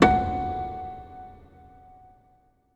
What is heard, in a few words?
PIANO F#5; Best Service; Advanced Media Trax Modern Composer; PNO HAMON